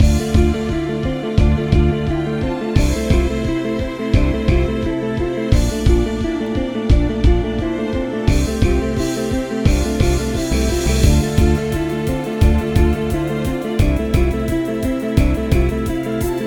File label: Infected Quintet